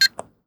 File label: PIN Beep; SFX; IRL Recorded